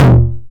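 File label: 909 mid tom tape saturation; Goldbaby Tape808 & Tape909; The Tape 909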